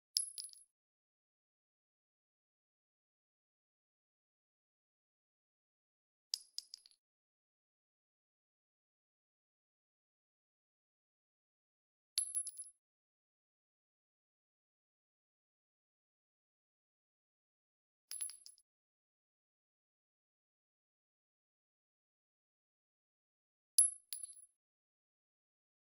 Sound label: 9mm Shell Drop On Concrete; Hollywood Edge; Heavily Armed